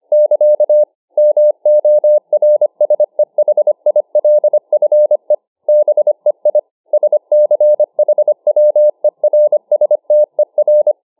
Morse 25 WpM

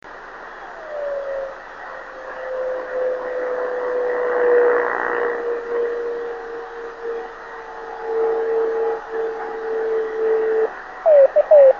26560KHz

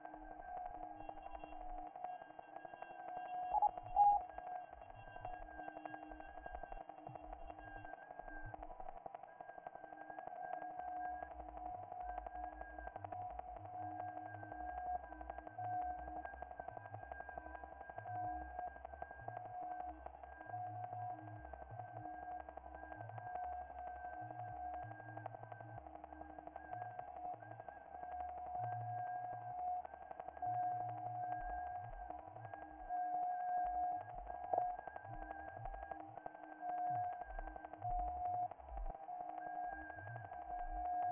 qrss audio

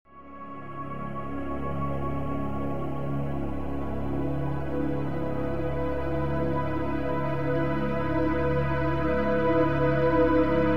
MUSIC

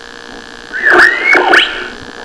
tuning

